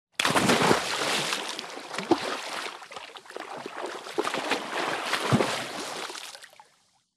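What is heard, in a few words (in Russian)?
ныряет и начинает плыть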